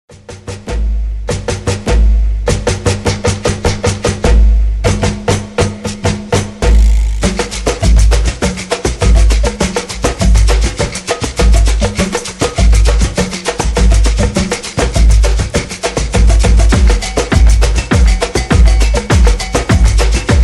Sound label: SAMBA Acelerando (51BPM)